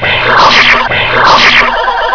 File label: tin thunder